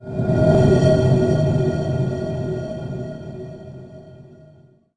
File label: Magic Square Win; Dark Dimensions: City of Fog